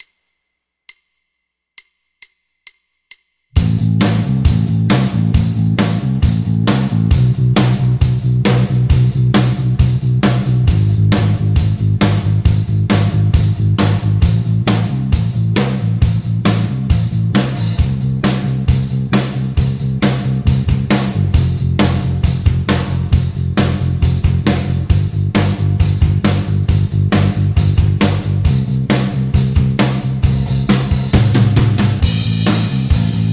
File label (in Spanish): ESTUDIO DE PICKING A, guitarra